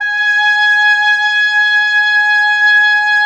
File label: WND OB F, Oboe f; Roland - LA Composer vol. 4